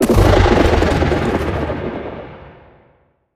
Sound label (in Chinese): 音爆声; 监守者